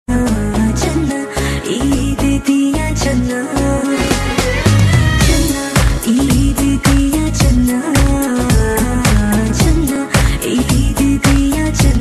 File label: Punjabi Ringtone